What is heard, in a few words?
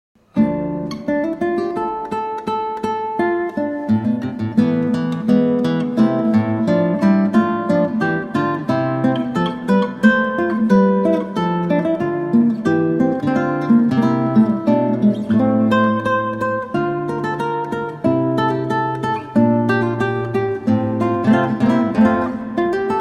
Preludio, Guitar; L Roncalli